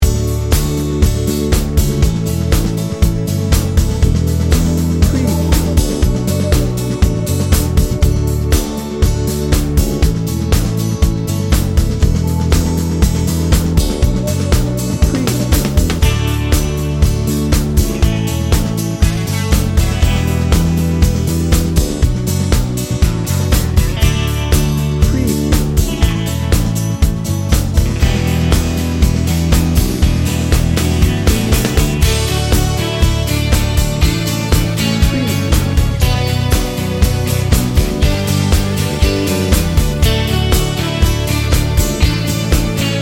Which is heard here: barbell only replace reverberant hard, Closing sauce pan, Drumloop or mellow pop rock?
mellow pop rock